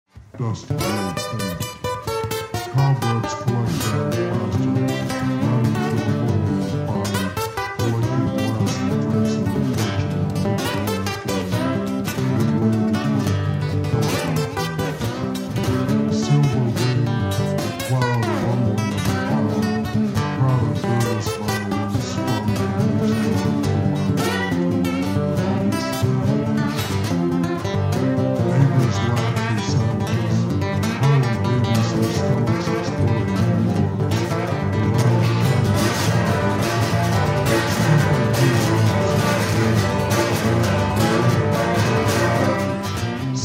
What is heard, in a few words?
Dobro